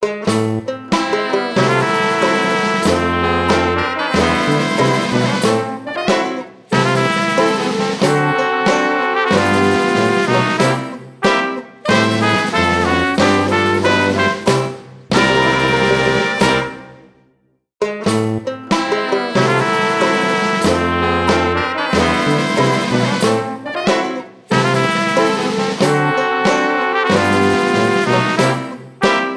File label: Diner Gumbo Tasting, Music; Frog Music Streamed